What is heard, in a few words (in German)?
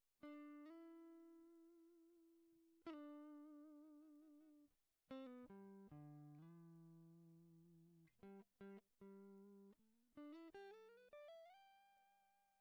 Gtr Melodie